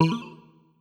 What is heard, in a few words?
hit enemy